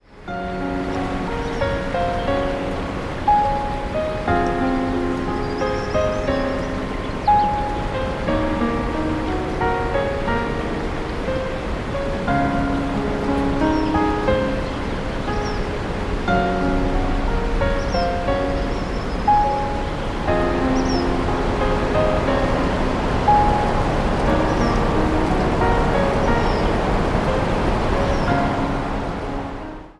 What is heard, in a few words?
A gentle wind; MIUI12